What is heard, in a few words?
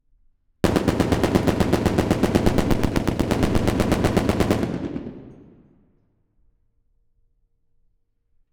STREET BROWNING M2 BURST 50M FRONT; DPA4060 PAIR; AUDIOBEAST; FIBUA; WEAPONS RECORDING PRIMER